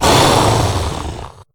zombie attack; DeadFright

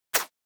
Button Whoosh Sci-Fi Small Air Button Beep; Hollywood Edge; sound designer's toolkit v2

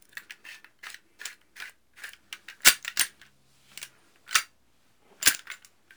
Gun Handling Weapon, Weapons Foley; SFX Kit

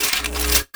Gun Draw Select Scifi, Weapons Foley; SFX Kit

